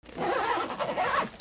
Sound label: RIPPING